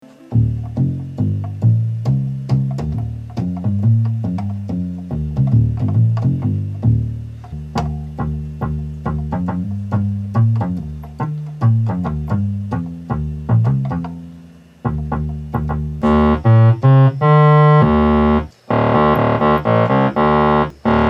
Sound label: wersi bass synthesizer